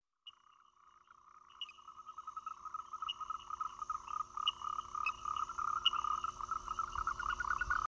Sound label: Chirixalus eiffingeri, tree frog of Taiwan; 艾氏樹蛙